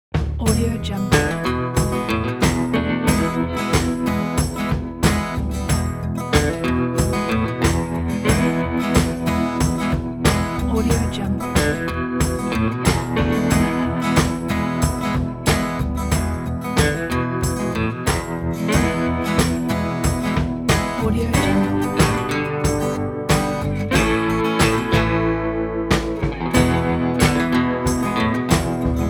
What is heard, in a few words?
country blues